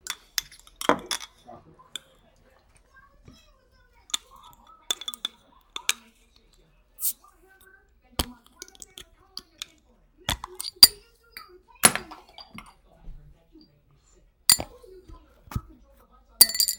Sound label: clinking slow